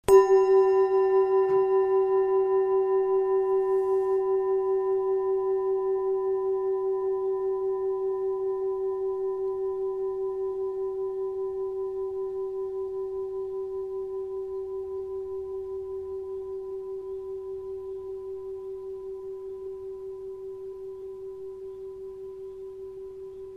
planet bowl; synodischer tag

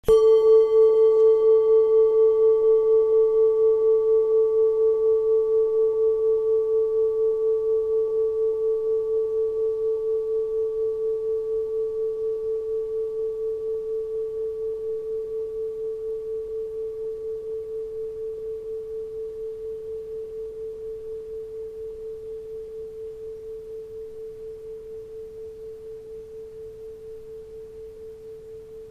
planet bowl

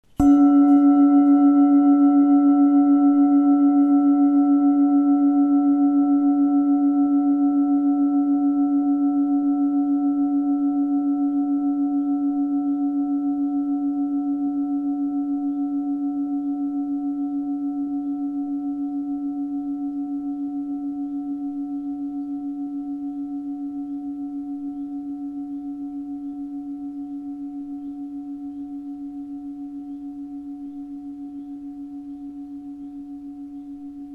planet bowl

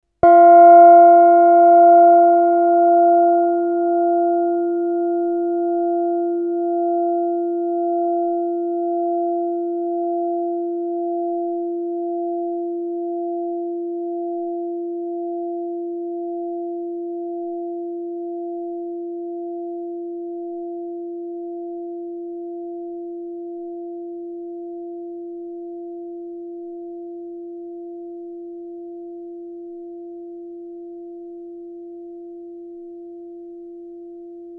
gong oblong; platonisches jahr

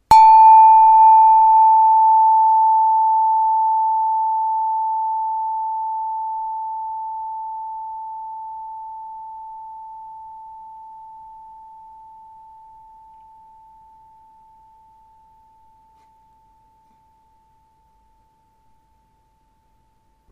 cosmic tube

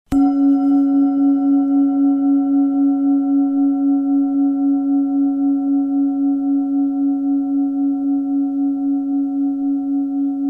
planet bowl merkur

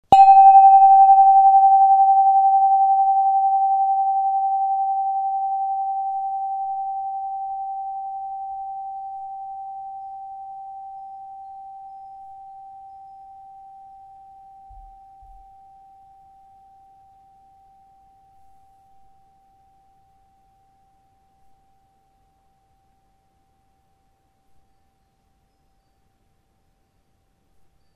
cosmic tube; synodischer tag

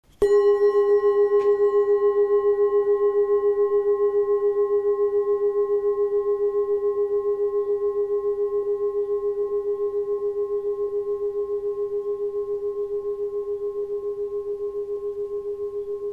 planet bowl

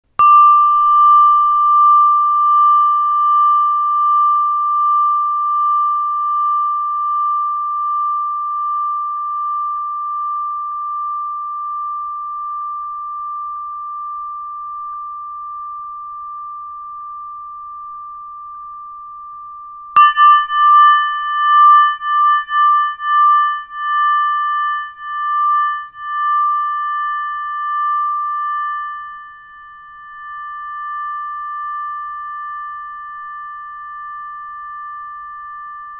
trigon saturn

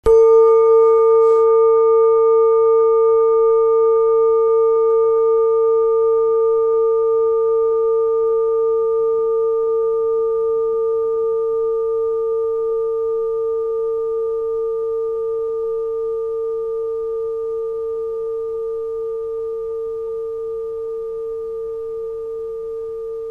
planet bowl; siderischer mond

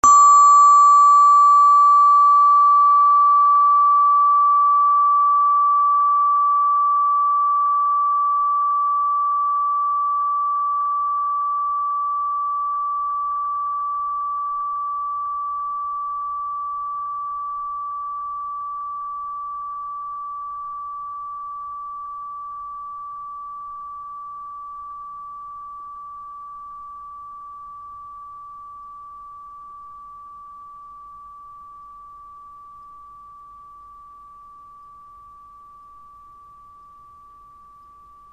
space bar mars

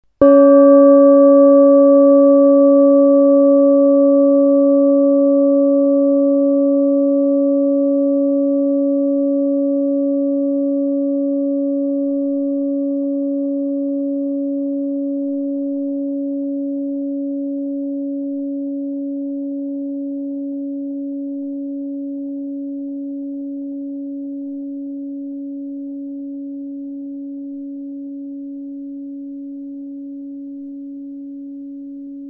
gong oblong

